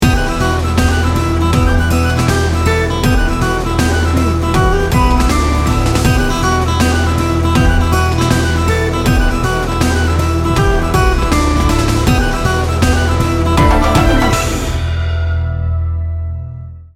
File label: celtic hero